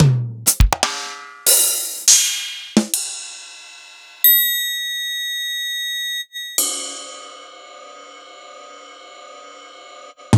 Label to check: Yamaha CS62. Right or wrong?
Right